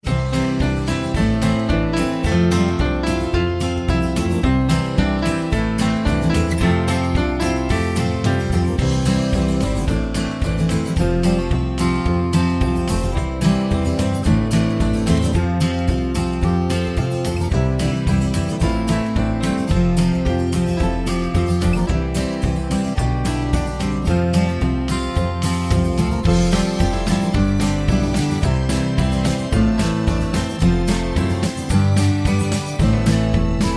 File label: Instrumental